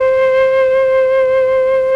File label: flt c3, Flute, MELLOTRON; Retro Sampler; Brass + Flute